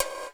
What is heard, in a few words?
PERC GATED; Crypton; DrumBase5000